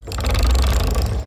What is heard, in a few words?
gear